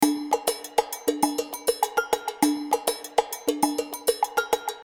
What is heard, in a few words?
Perc Close Hall